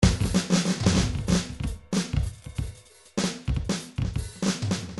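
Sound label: drums gogo fill; Paul Kodish Dangerous Drums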